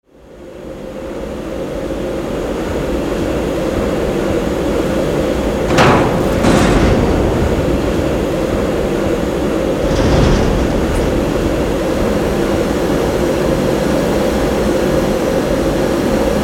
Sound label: industrial ambiences and sfx; bluezone corporation dark mesa